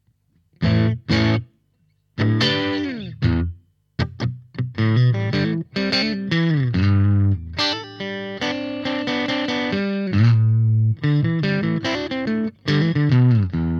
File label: Triode clean; lampes